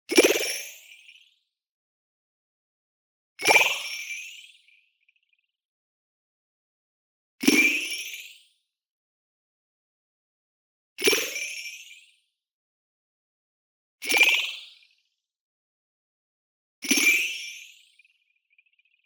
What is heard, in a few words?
BLING Pitch Delay Bell; boom library; Anime Essentials